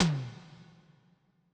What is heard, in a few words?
Toms; Touch Loops; CosmicFunk